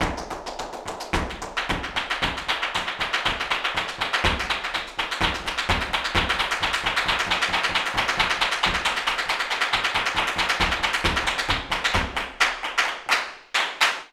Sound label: flamenco tapping, jaleo; Best Service ProSamples vol.46 - Flamenco